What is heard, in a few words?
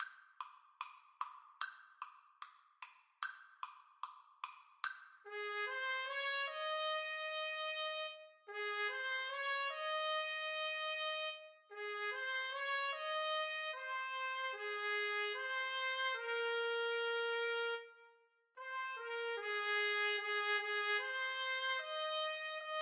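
when the saints trumpets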